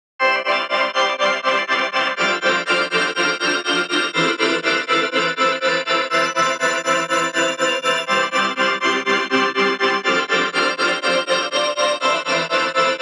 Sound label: tonal loop crystal chord 122 Cmaj